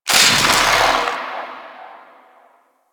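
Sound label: Distant Electricity; Ambience; Warframe; Corpus Gas City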